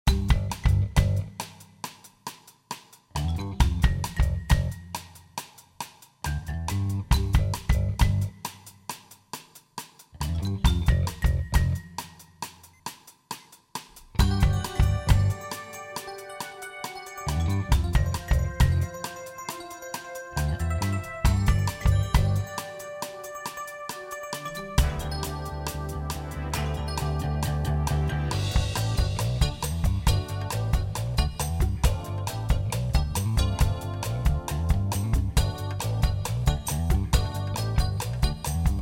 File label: Jazz